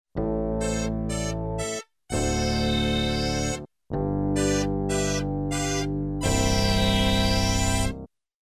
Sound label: fanfare